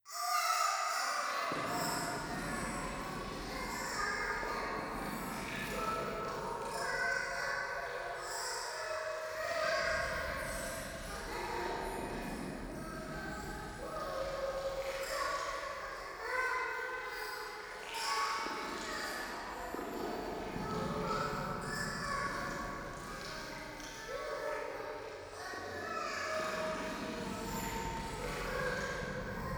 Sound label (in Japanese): 廃墟 シャブ喘ぎ 触手